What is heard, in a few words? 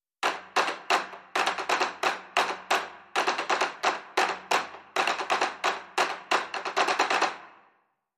Loop Layer 2 Sticks